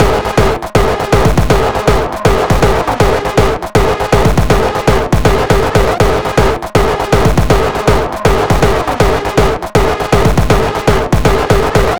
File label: Beats Loop; Anger Foot